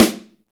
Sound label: snare, Roland; Club-50 - Foundations Roland; Ext.Snare 1